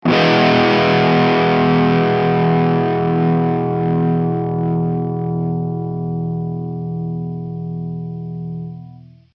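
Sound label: Notes Distorted E; Hylics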